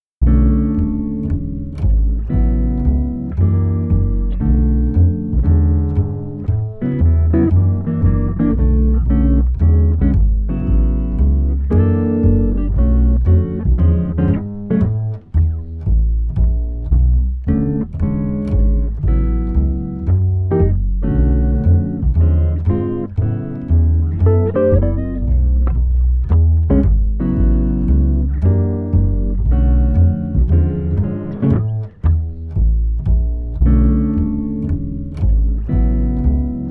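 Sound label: Street Mellow Jazz Guitar Bass, 115 bpm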